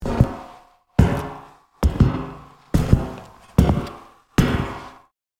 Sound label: Footsteps on metal surface boots walk